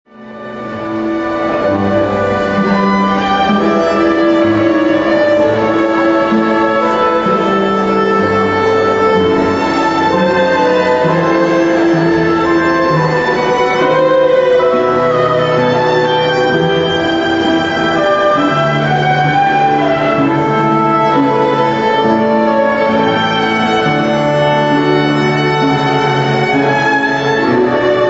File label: aria 4 corda